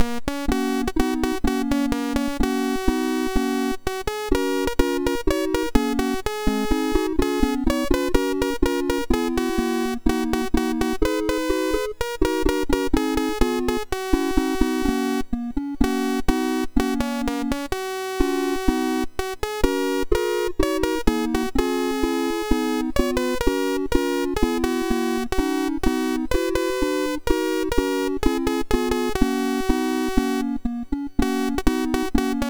sid MOS6581R4; Bear George

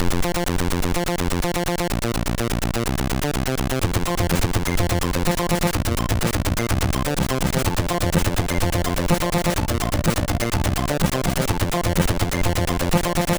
sid MOS6581R2; Poison Logo

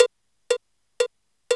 click track; Music-X

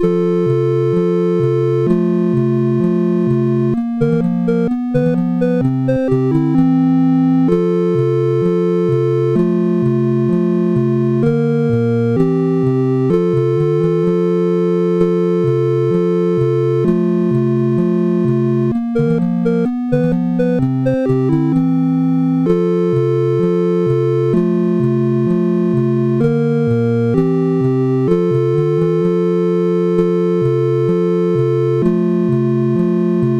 sid CSG8580R5; Pitfall II-Lost Caverns

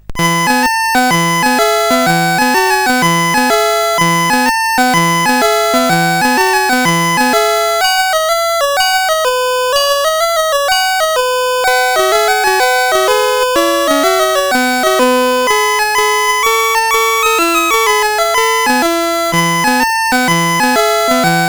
Buzz Off, CSG8580R5; GAMES

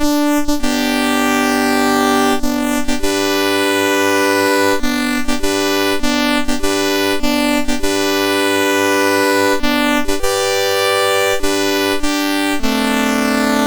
Taps; MOS6581R3

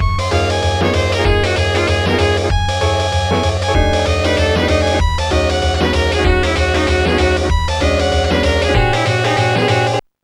bop fiddle; Instant Music

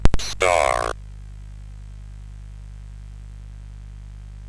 sid CSG8580R5; Cave of the Word Wizard Set 4

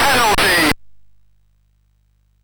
sid MOS6581R3; Five a Side

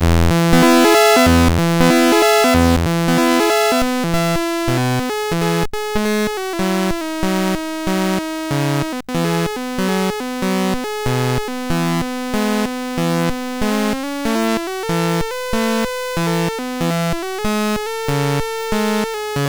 sid MOS6581R2; Rekenwonder

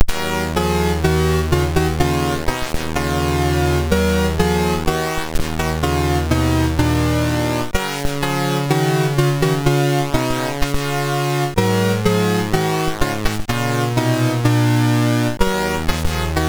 sid MOS6581R3; Block n Roll

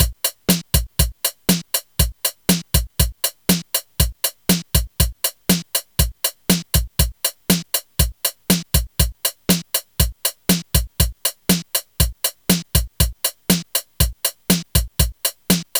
drum pat; Music-X

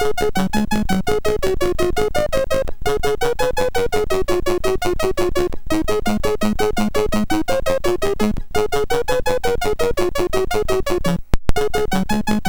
Quick Thinking Robot Tales BASIC, MOS6581R3

